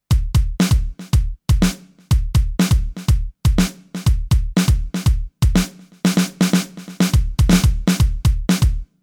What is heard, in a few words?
Roland TM 2 Dance 4 on F